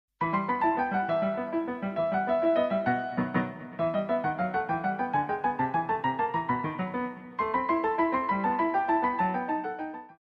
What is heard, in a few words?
Tarantella